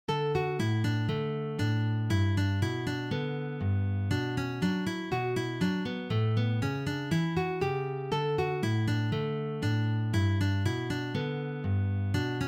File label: Picking